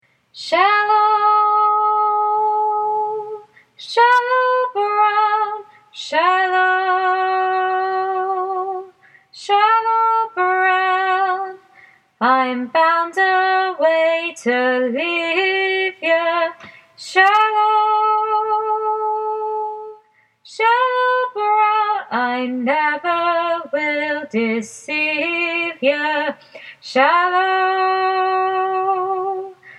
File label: Shallow Brown, High Part